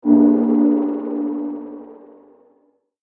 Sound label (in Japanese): 不気味な出現音